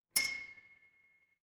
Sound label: glass clink